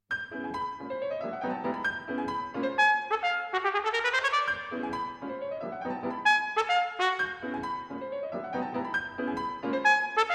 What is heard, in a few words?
Trumpet & Piano, Danse; Incantation, Thrène et Danse; Arr. For Trumpet & Piano